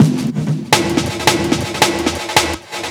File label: Your Break, Breaks, Jungle; Blu Mar Ten - Jungle Jungle - 1989 to 1999 Samplepack